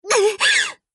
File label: damage